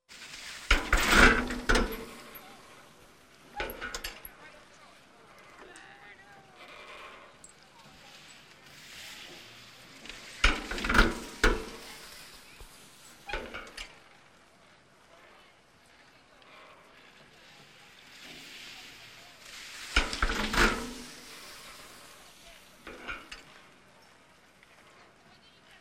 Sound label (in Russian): lyzhnyj lift